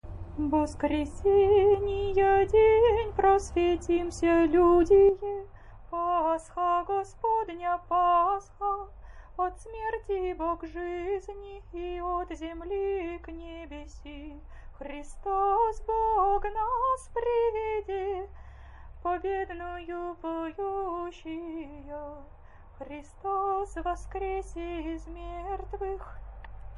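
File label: easter canon byzantine hirmos faster, singing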